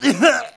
death; mohaa; dialogue

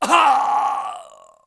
death; mohaa; dialogue

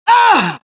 death, dialogue, Allies; mohaa; Mission 2

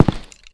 player step; xash3d fwgs

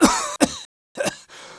Jeep Ride Cough; mohaa

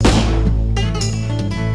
death; singe

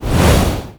fireball; cdogs

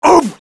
damage; mohaa; dialogue

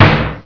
drone shot; hurrican

